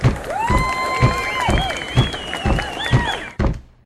crowd stomping

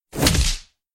card punch

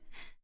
gasp

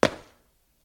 jump shoes

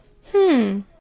hmm

